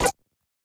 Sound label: Cursor; Mesukemo-Hime-no-Kaisuiyoku